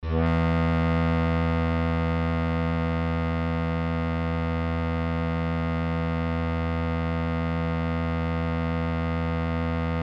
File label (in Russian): 3 struna, struni gitari